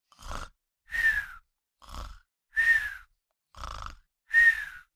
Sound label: Cartoon little child voice snore snoring